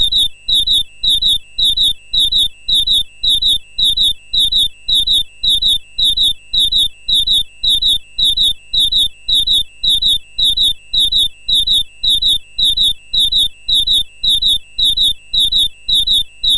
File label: Alarm, Sound Effects; MOS6581R2